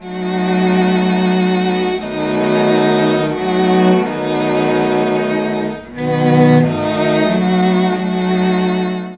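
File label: bass strings, Mellotron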